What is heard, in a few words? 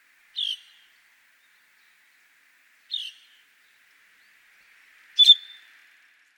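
Asian glossy starling, ishigaki, call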